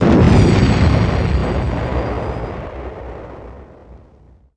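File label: combat explode a wing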